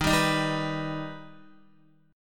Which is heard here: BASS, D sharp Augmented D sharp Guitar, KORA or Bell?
D sharp Augmented D sharp Guitar